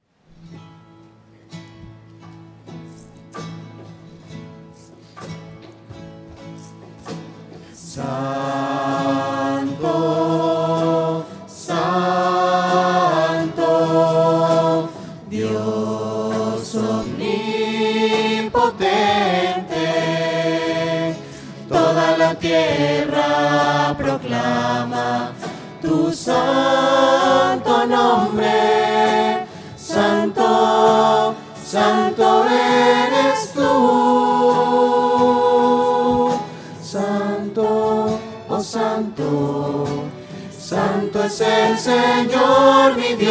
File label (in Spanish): santo pacto voces; misa